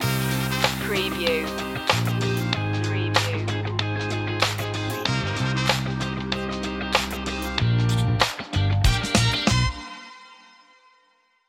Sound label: forever funk stinger